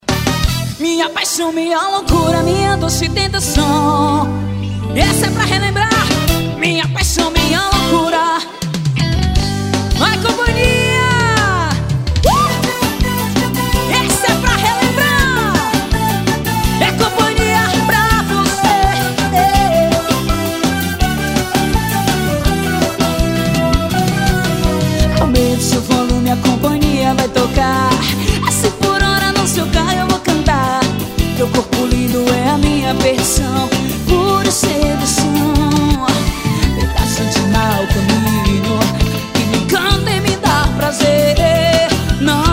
calypso

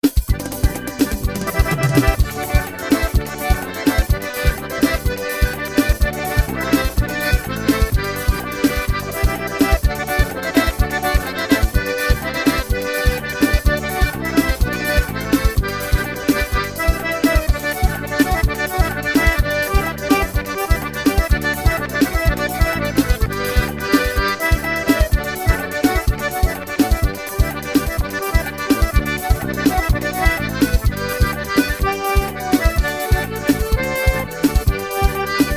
forró xoteado solo

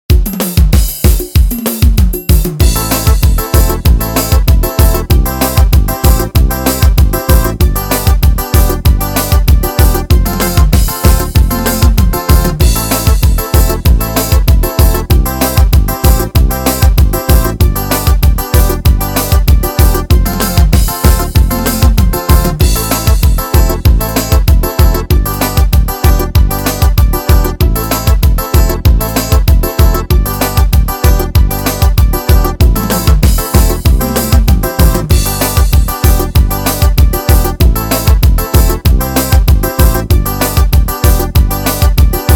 forro louco estilo; yamaha

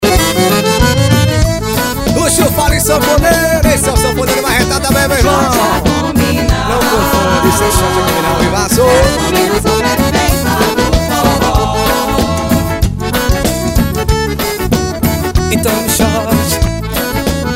forró ao vivo

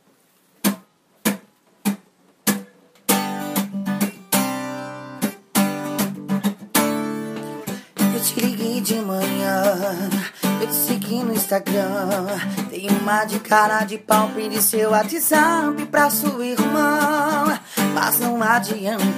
99 bpm cantando

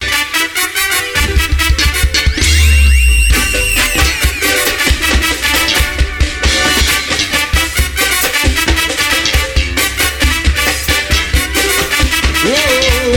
samba

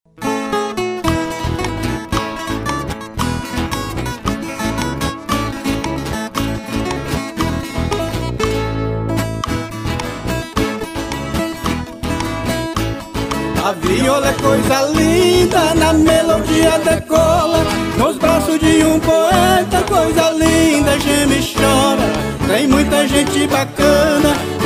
viola

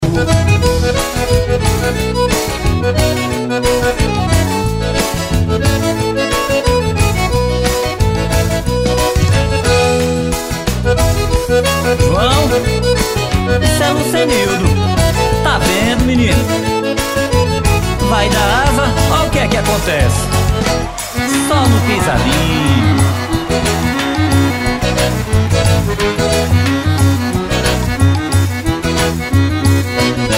drums xote puladinho

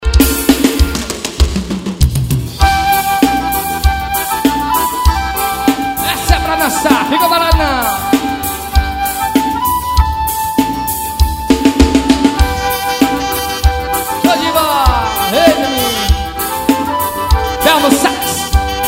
acordeon

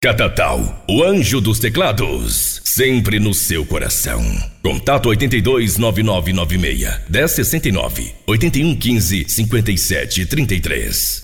vinheta

